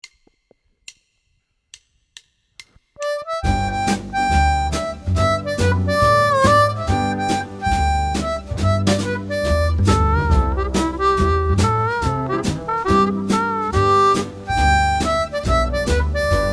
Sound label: G 140 harp